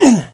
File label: hurt